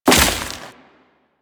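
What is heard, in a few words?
Crewman Step Vegetation, Footsteps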